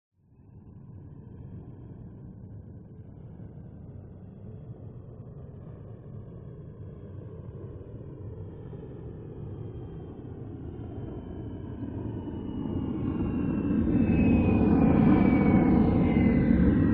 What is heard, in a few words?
TRANSPORTATION JET MILITARY A-10 Thunderbolt Flyby Left To Right Distant